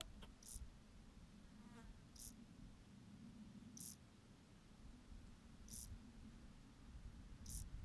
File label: Chorthippus brunneus